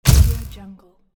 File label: flash light